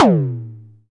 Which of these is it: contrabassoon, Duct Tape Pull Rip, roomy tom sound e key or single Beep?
roomy tom sound e key